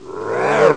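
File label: roar death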